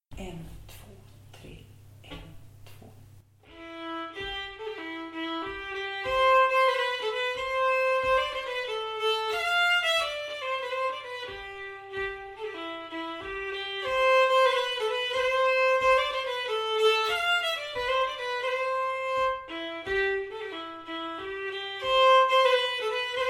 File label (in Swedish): Polska, Bingsjö; efter Ritek Jerk; Allspelslåtar